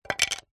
foley wood strip small thin drop or set down hard surface; zapsplat